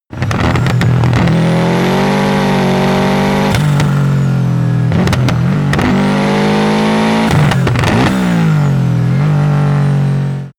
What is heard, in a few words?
car subaru impreza short accelerations